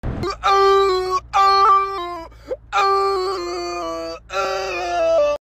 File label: aouuuuu aouuuu aouuuuuuuuuu uuuuaaaahhh